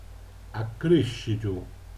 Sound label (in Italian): starnuto